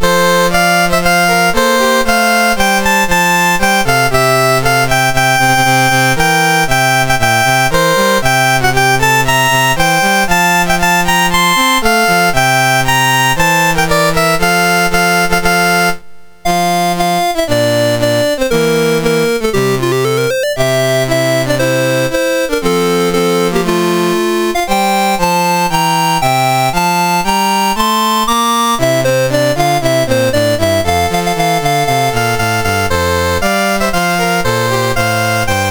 United Arab Emirates, National Anthems, MOS 6581; MOS6581R2

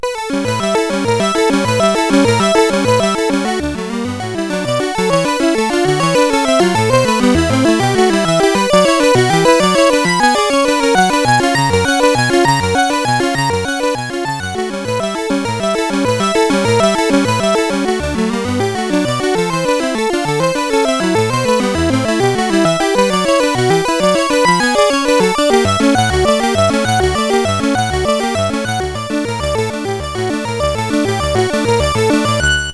Prelude in D Major, CSG8580R5; Op28 No5

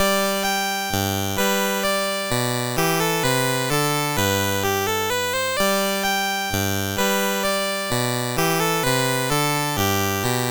Minuet English Gigue, MOS6581R2

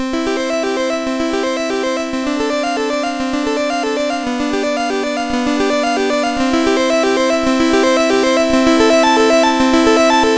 Prelude, MOS6581R2

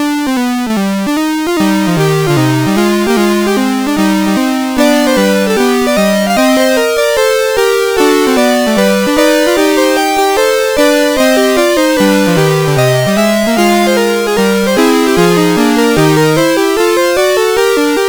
Fugue, CSG8580R5; Fugue VII 5

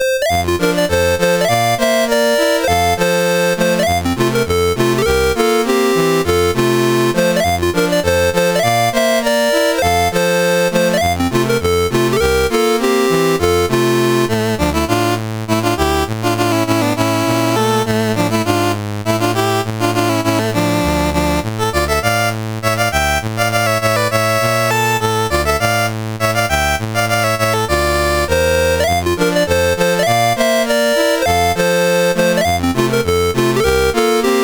Hungarian dance in F, mus CSG8580R5

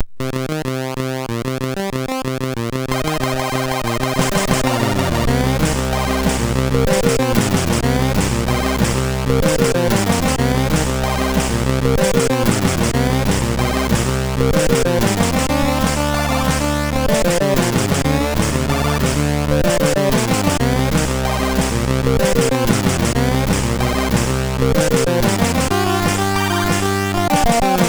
sid, MOS6581R4

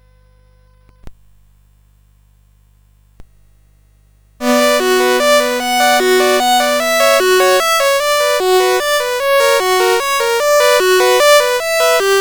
Prelude, CSG8580; Syl

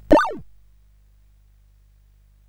sid CSG8580R5; Crossfire

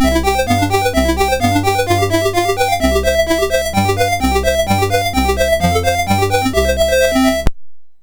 First Duet, MOS6581R2

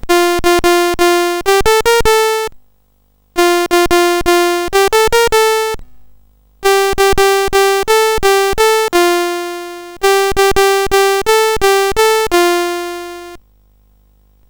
BASIC sid CSG8580R5; Ballonflug; hvsc

